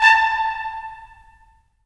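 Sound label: brass trumpets staccato, right; Roland LA Composer vol. 4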